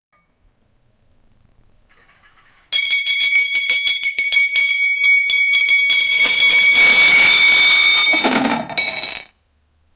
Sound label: explosive crash of bowling